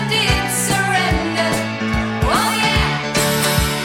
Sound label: music; wu xing; earth